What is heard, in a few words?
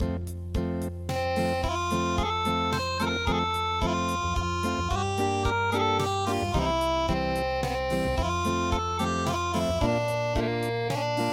Acoustic, traditional; midi